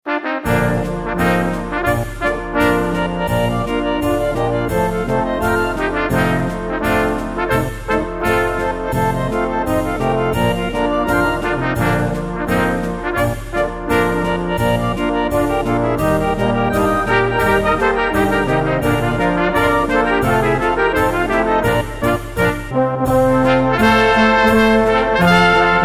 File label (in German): Fussball Boarischer